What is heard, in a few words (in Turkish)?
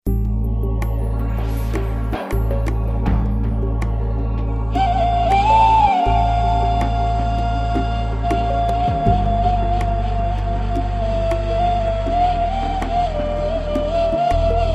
tyros3 ney taksim